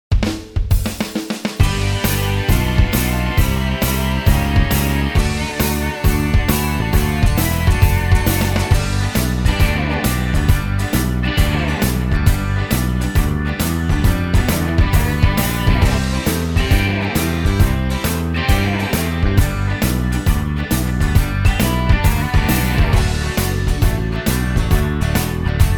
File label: ROCK AND ROLL, SINGBACK; BULGARIAN